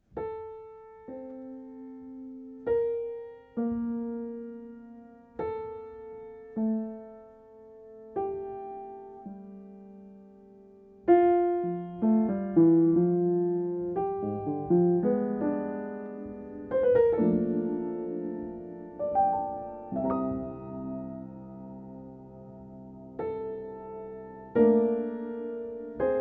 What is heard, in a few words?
Solo Piano